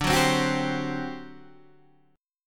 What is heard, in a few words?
D 11th D, down, Guitar, Standard; D11 strummed; JGuitar